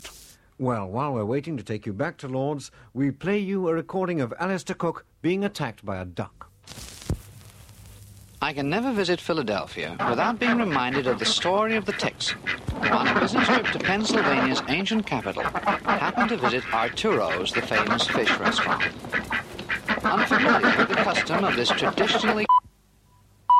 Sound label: duck